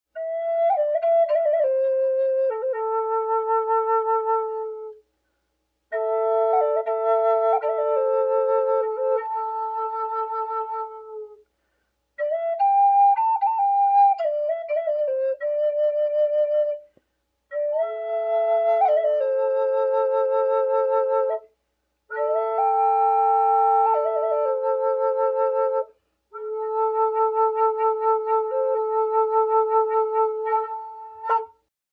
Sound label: Drone Medium A Purple Heart, flutes; FlutesDrones